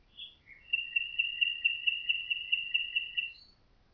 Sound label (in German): Nachtigall Triller mit Einpfiff